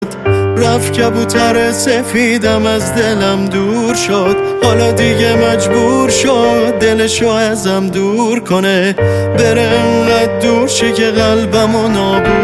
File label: Piano Version